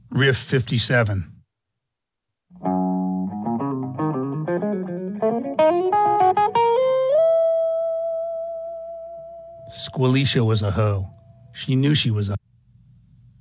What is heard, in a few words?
Riff